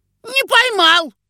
fail; grinch cheater

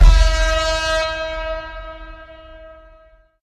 start go; race

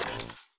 basket

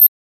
bomb tick; interception

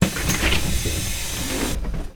open

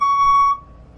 reverse signal, vehicle

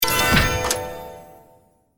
win weapon, casino

